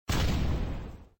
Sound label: explode